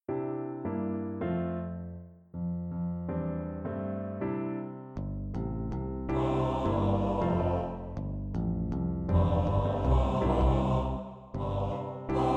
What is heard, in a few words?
for Mixed Voice Choir